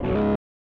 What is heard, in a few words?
Unused Jingle; bs satesupudx 4; snes